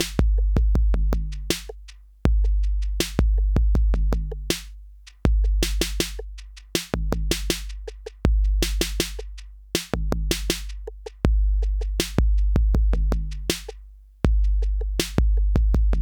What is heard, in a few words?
Chandler Limited Zener Bender Software 808B COMP; Softube